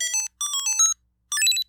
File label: Startup; Pokemon Mini BIOS